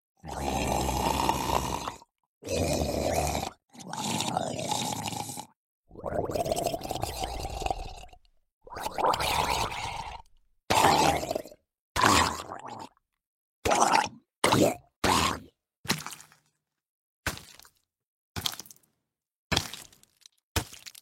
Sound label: drowned zombies sounds; games; minecraft